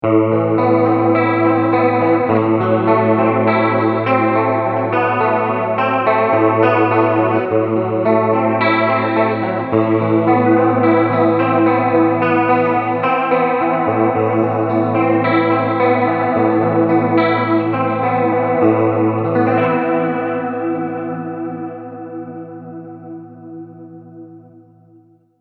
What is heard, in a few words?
Mooer GTRS Guitars Standard 800 Rock